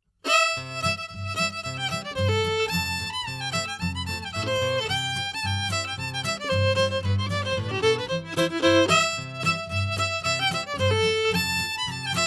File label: fiddle